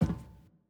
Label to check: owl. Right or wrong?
Wrong